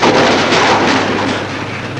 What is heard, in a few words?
trash